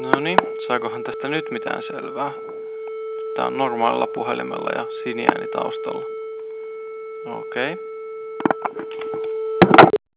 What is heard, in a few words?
landline noise